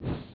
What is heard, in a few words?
whisk